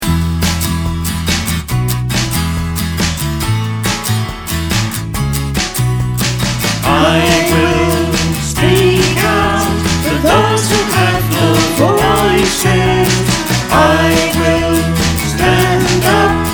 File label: choir